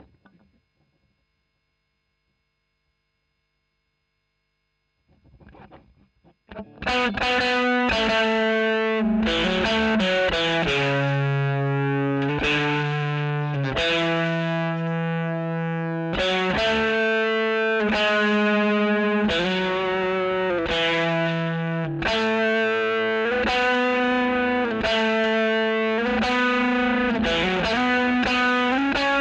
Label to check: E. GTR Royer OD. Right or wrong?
Right